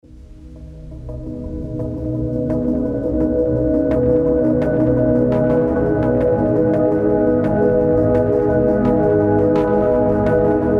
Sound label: Celestial Ambience